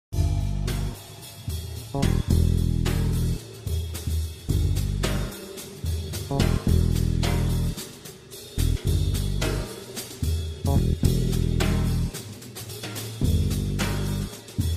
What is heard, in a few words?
Trio Etudes Simples; Leo Brouwer